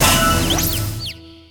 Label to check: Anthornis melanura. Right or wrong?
Wrong